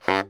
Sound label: BARI FF G 1, saxophones; Greytsounds - Philip Wolfe David Crozier's Saxophones; BARI SHORTS